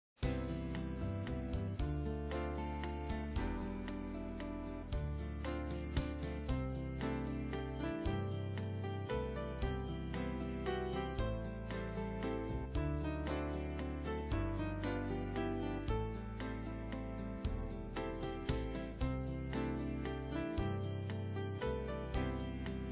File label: Reversed Waltz